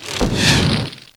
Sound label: bow puny fire miss; wesnoth 1.12.2